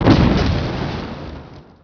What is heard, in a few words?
explosion small; Emperor - Battle for Dune